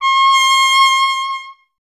BRS TP, Trumpet; Miroslav Vitous

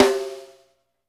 HIT, SNARES; Sampleheads; New York City Drumworks VOL-1

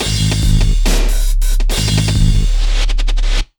TECH 140, Big Beat Drumloops

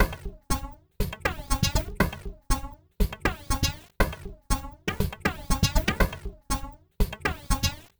Candy Machine, Processed Percussion

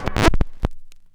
HOOPS, VINYLS

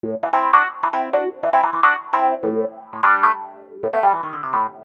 Wah Phunk Ep; Construction Kit; HIP-HOP; Sonic Foundry (Sony Creative Software)

